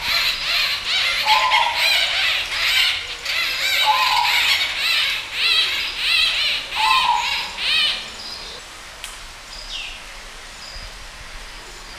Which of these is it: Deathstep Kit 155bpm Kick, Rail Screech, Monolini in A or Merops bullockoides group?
Merops bullockoides group